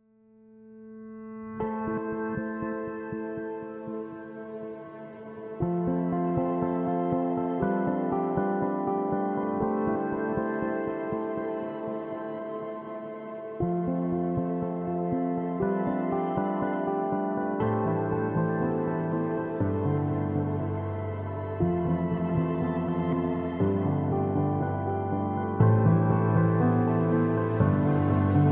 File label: Guitars Synths and Piano Only